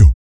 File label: VOC PERC, Vocal Planet Jazz FX BASS PERC